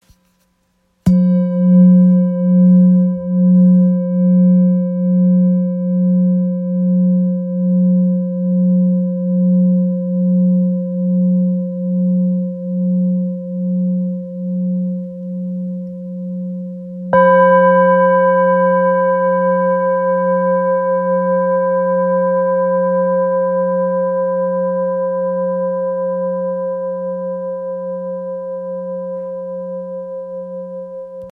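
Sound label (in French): bol therapeute fa, bols chantants tibétains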